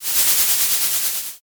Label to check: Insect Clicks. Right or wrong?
Wrong